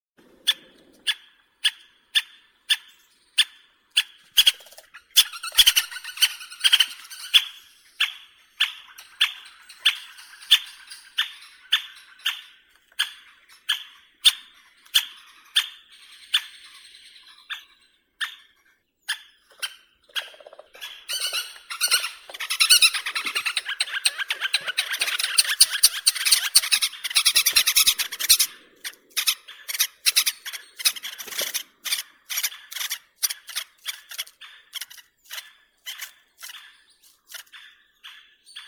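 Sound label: white-backed woodpecker, Dendrocopos leucotos; dyatel belospinnii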